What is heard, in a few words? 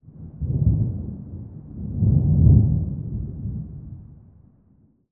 thunder rumble mid; fienup